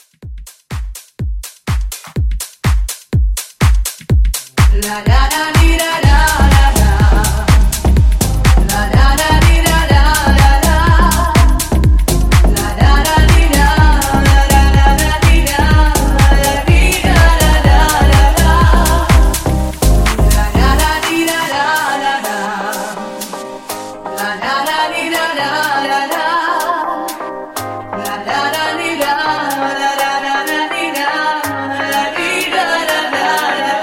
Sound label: 90s Dance Mashup 124